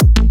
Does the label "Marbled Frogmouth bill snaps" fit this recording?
No